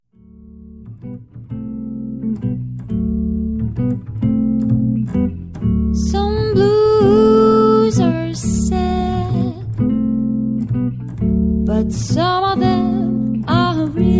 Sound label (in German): ensemble medley trio mit gesang